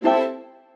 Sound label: orch sting; musicradar; cartoon caper